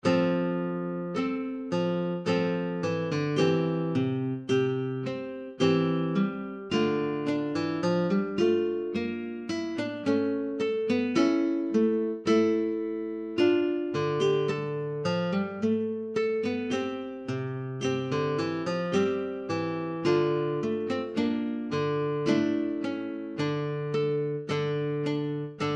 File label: lute, midi; song book